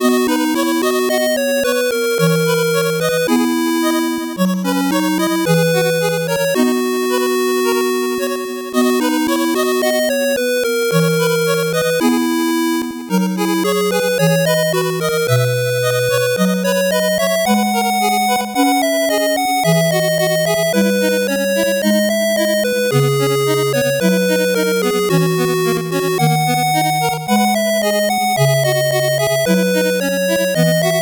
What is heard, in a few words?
8bit bgm fast